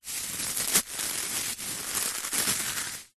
leisure hacky sack squeeze crunch

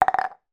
household plastic childs cup down on table

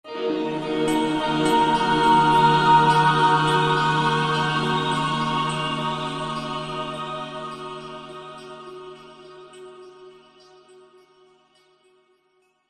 musical dreamy heavely swell; zapsplat

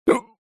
human male single hiccup mouth closed; zapsplat

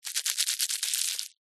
office sellotape ball roll in hands

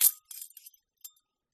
handcuffs snap and swing; the sound pack tree

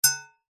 impacts metal hit thin ping

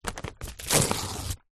paper rip thin magazine page; foley

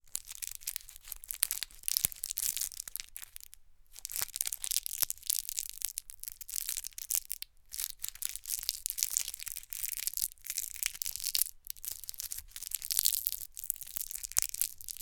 food lollipop wrapper movement remove from lolly

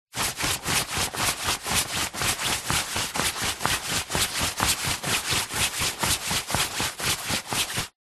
foley coat winter thick running movements arms

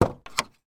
transportation van door open external iveco; sound effects one

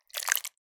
horror guts bloody splat squelch in plastic bowl

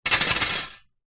clothes hanger metal wire set down on glass table; household

